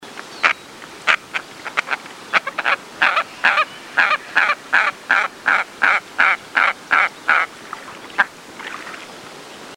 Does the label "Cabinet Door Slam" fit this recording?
No